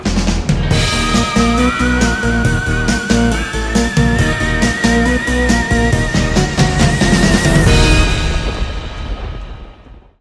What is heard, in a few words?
outro music; ff2; ghostface